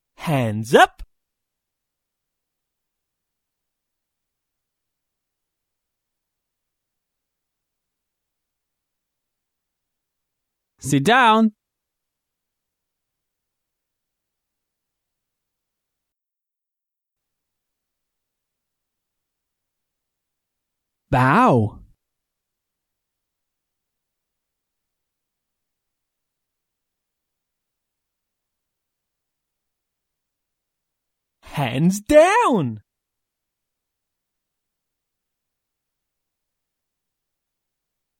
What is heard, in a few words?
Genki Disco Warm Up